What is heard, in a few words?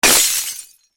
Glass Smash Crash, Impact, Glass, Smash, Crash; Smashes; Objects; Blastwave